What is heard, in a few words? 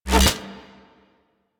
Fishing Spear Line Retract Catch; Warframe; Venus Fishing Spears